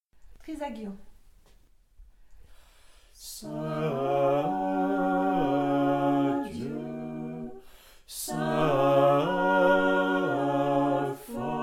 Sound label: TRISAGION